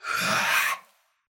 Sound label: vampire; halloween